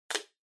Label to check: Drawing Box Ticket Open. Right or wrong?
Right